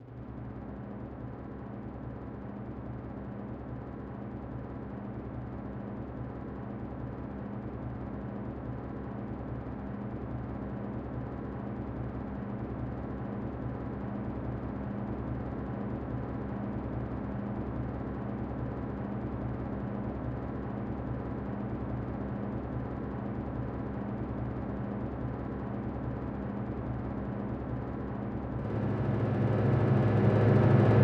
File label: Timpani 54 bpm; Zarathustra